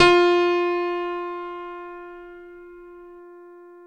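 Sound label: dance piano F3; Best Service Advanced Media Trax Modern Composer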